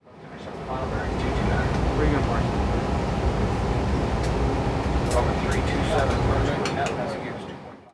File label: military ship; fx; PC Gamer IT CD 40; SMACDEMO